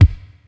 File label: kick single hit g key; kick drum samples